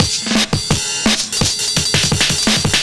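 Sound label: Drum and Bass Beat 170, drumnbass 170bpm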